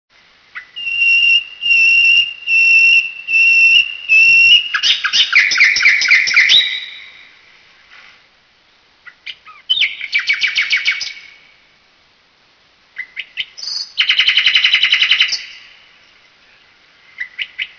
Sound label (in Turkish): orj bulbul kanarya sesi